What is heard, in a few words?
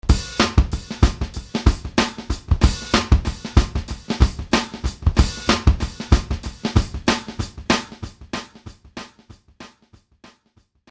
echo